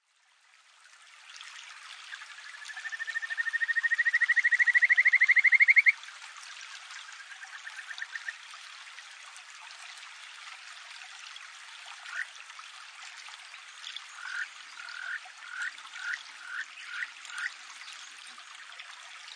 kajikagaeru

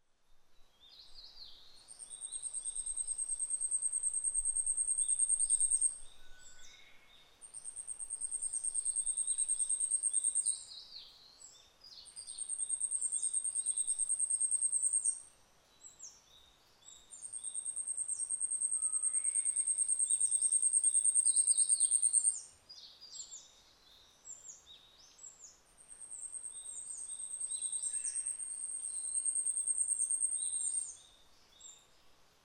yabusame

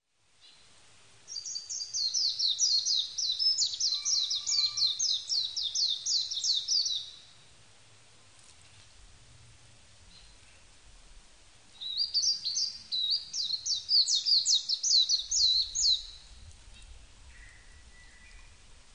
mejiro

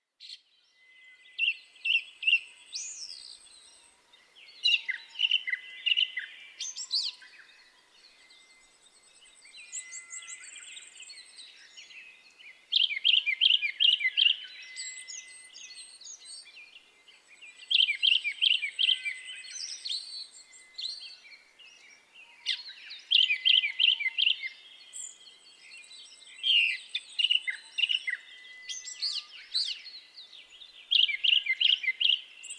kurotugumi

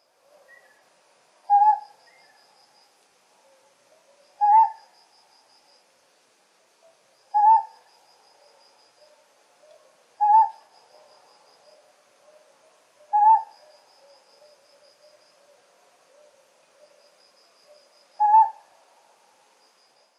ryuukyuukonohazuku

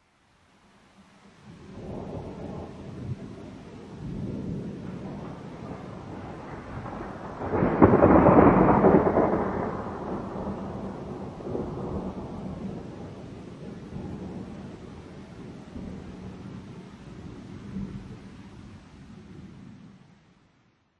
kaminari

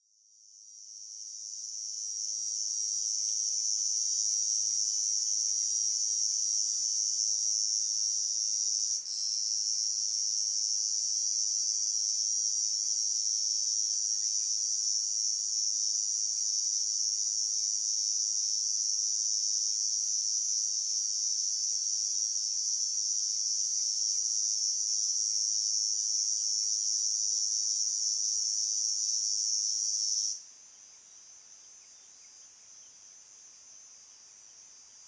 koezozemi

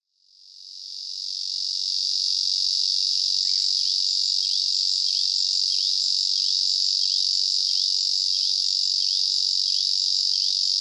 ezozemi

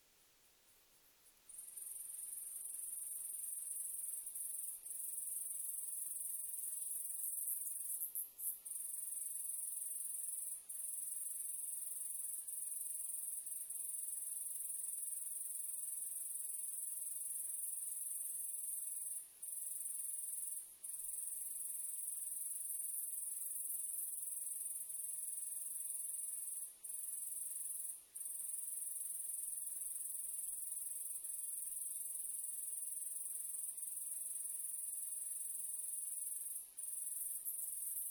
yabukiri

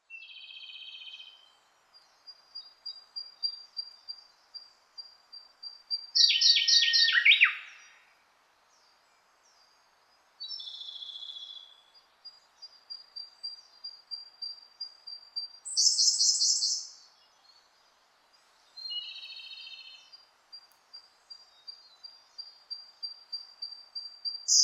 koruri komadori

